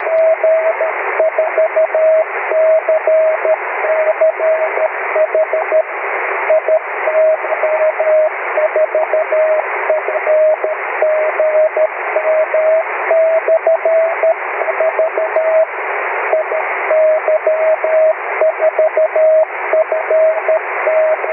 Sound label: cw; iy4fgm